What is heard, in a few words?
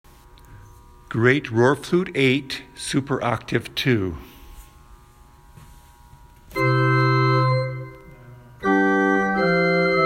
Great Flute, pipe organ; lake ave; Leek Pipe Organs